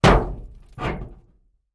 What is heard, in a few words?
Hollow Metal Hits Lot, barrels buckets cans